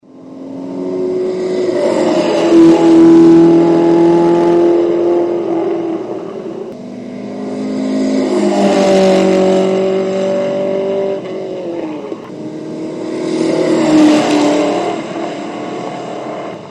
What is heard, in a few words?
cars sound